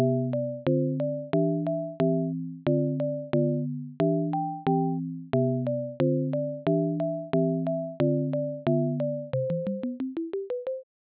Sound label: Mary Lamb Stereo